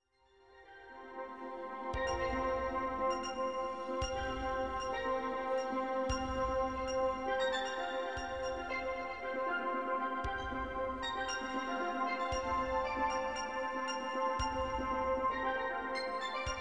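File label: tambourine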